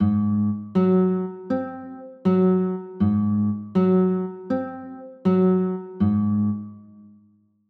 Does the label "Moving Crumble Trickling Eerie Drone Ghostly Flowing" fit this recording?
No